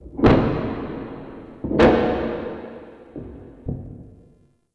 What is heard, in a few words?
Freaky Taps; Ambience; Warframe; Shadowgrapher